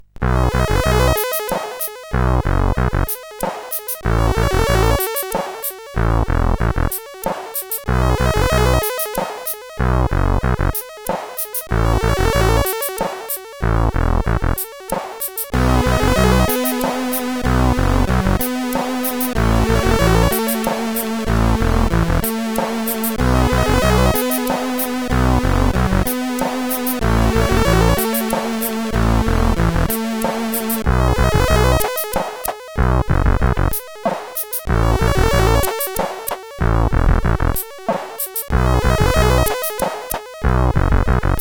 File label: Music, CSG8580R5